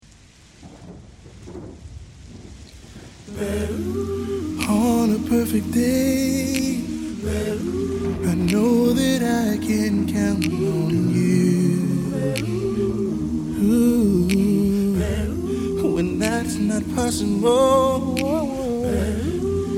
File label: Acapella